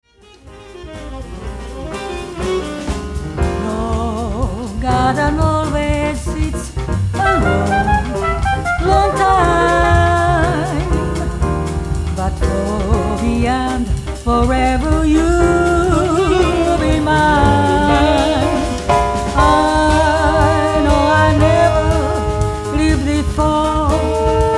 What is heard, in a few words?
More Samba